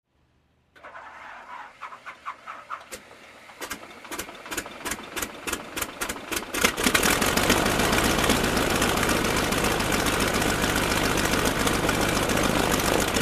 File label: Light Aircraft Engine Start